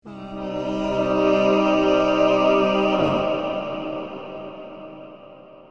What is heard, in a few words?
male vocoded reverb vox